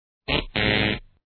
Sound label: wrong sound